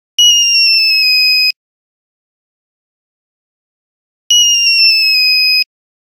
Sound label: Nokia Buzzer Rocket; Windows Phone apps